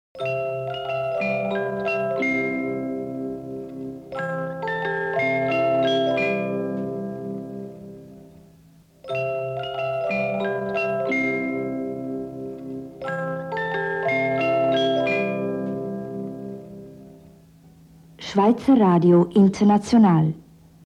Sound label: Radio Interval Signal; Radio Suica